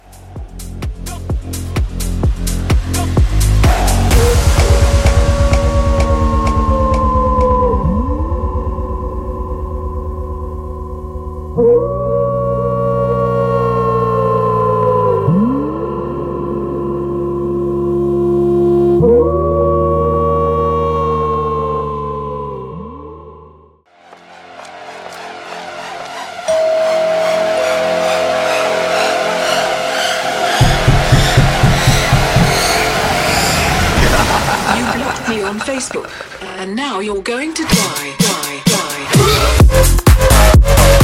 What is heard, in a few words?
Halloween Edit 128 CLEAN